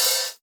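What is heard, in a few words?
OPEN HH, DRUM KITS; InVision Interactive; 40 Oz Phat Slammin Hip Hop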